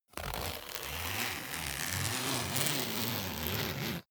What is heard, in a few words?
zipper pullover jacket open slow